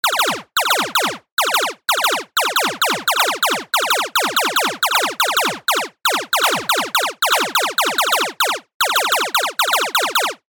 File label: leisure video game retro laser gun fire multiple game action